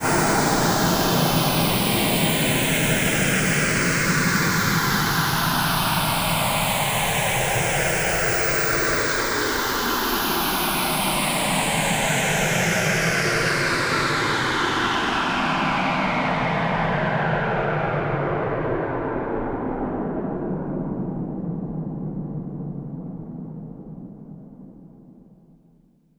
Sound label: Downlifter